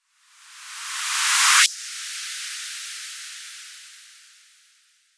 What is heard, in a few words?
sweep noise